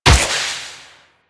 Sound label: Wall Run Step; Warframe; Wraith